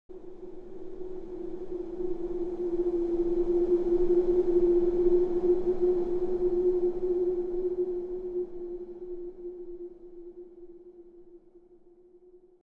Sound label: Horror accent sinister suspensful